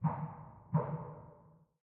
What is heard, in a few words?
bump outside ship